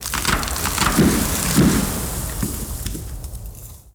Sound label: Forest Tree Fall; Warframe; Awakening Remaster